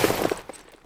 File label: footsteps, snow; player